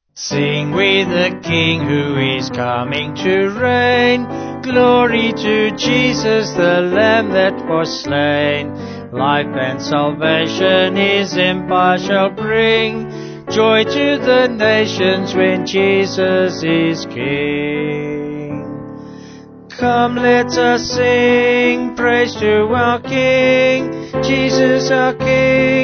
Vocals Piano

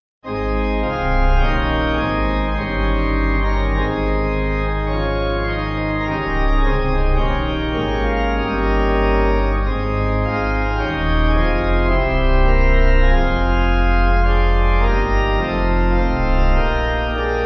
Pipe; 6/Ab

